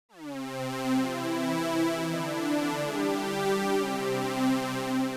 Synth sound Pitch env

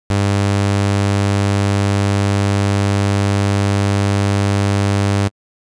Saw 100Hz

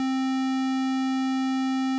Korg MS 20 SQUARE

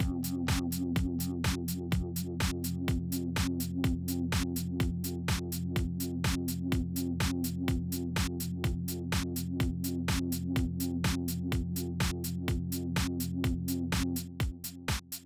LFO mode Sync

